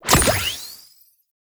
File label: Sticker Equip Menu Open; Deep Archimedia; Peely Pix